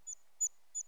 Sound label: armiger, bats; China bats